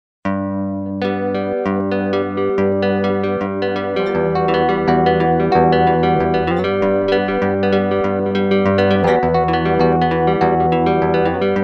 guit bridge

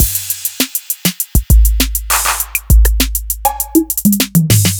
acoustic 808s kit; by uppercussion

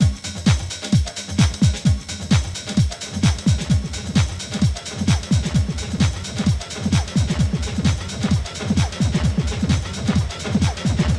house drum loop, reactive delay; septimbral

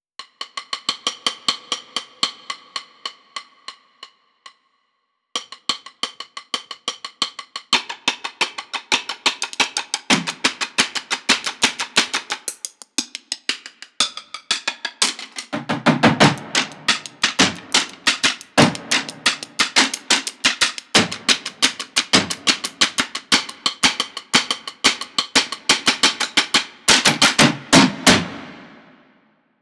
playable sticks; sticks solo ensemble and textures; pssst instruments

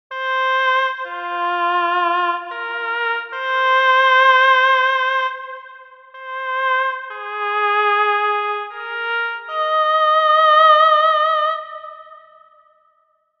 TTS1 oboe

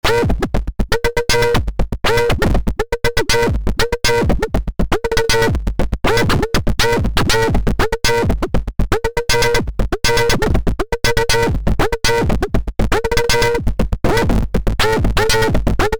drums

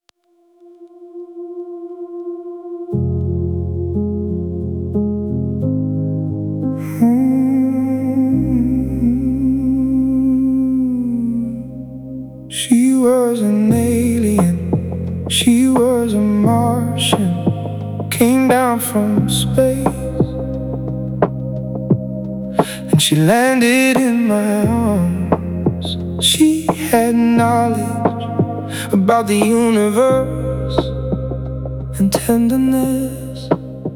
lo-fi streaming loud; transientik master; transientik labs

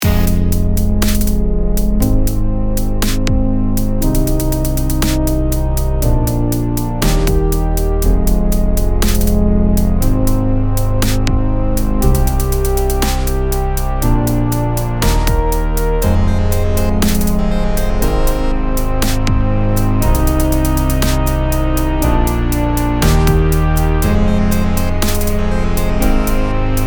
talking synth

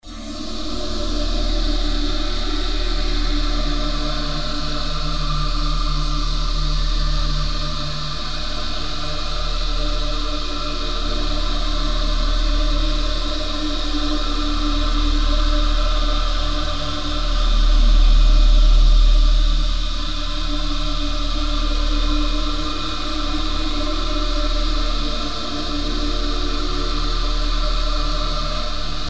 cathedral; into the light volume one for xfer records serum by samplebytes

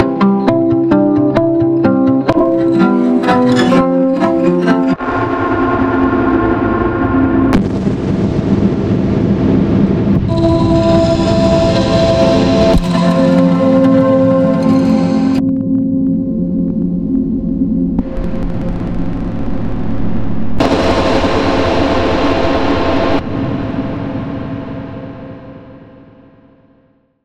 acoustic after; friendgineer labs

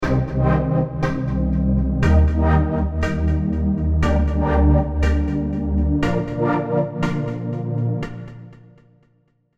vital presets; chiqui audio; vital presets pack

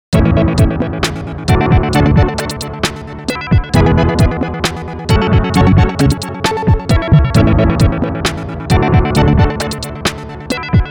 triangle, vibrato

